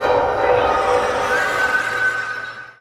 Boss Gate Hand Interact Charge In; Let It Die Inferno

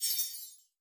Card Pack; Rabbids Multiverse

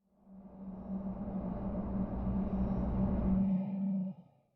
Sacrifice chest reverse

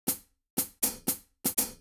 Hi Hat

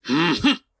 Shield; Col Shield

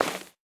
Ui Slide Stereo

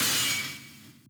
Shatter soft; Touhou Jitsuzaisou Dream Logical World

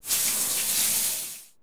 Manhole

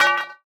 Physics Object Weight Impact

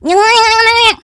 Wild Slash

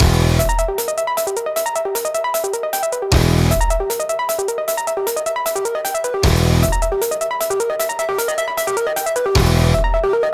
BGM battle normal; starbites

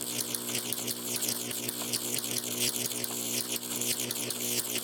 eagle boss Electricity

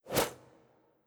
Human Hunter Throw; Legend of Keepers (gamerip) (2019)

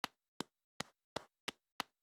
Walk Footsteps Stone; Hero

